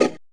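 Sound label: HH, hi-hat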